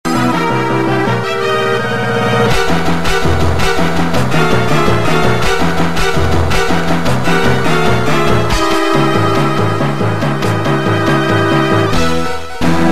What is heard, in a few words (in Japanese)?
RPG風ファンタジー編 ボス戦